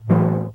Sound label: whomp; Tivo sound effects